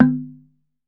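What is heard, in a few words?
TUNG LO, Tongue Drums, Pitched Percussion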